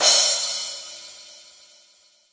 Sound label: CRASH TD, Roland TD 7; DRUM MACHINES